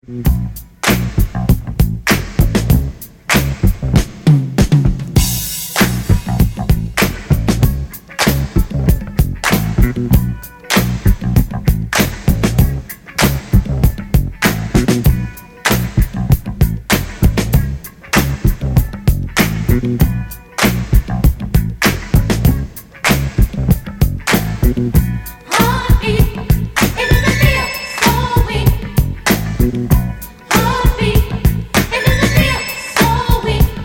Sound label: heartbeat